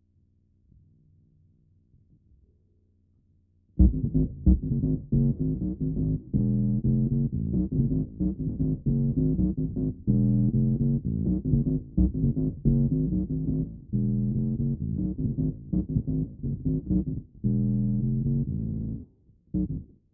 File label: oct bass, Recorded